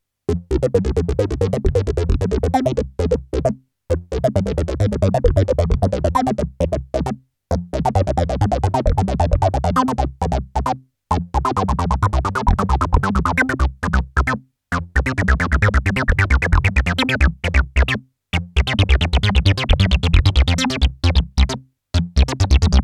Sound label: JX10 filter bass Res